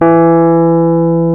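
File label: piano Rhodes Mk.V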